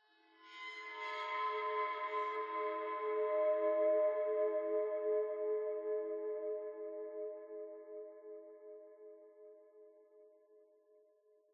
Interior Metal

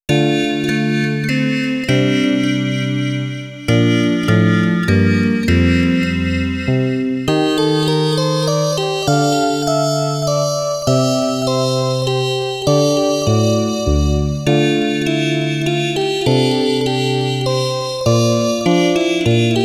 Music Box of Sadness; Gin Faints; lagrange point soundtracks returns

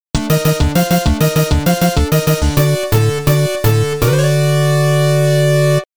PATTERN CLEAR; Contra Chronicle Vol.2 The Beginning of the Legends

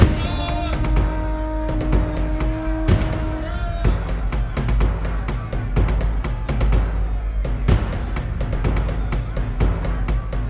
Pirate Hunter Music

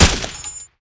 TAR21

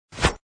Basic Attack; LEGO Ninjago Spinjitzu Smash

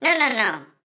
Poke Foot; talking tom cat 2 android ios online gamerip 2011

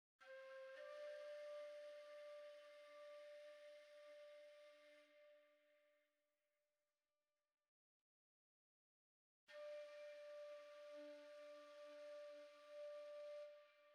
Flute

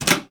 Panel Cafeteria Lever Pull; Among Us

